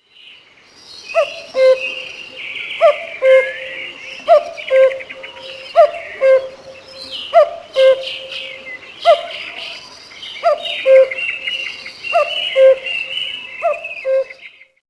Nature Eurasian Cuckoo

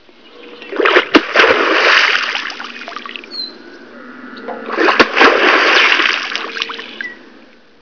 beaver

